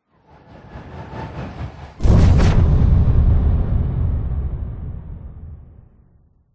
science fiction bomb incoming whoosh whizz explosion; zapsplat